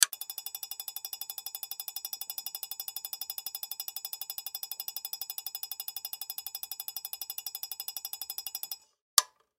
Strobe Light Turn, Foley Strobe Light Turn On High Speed Turn Off; Blastwave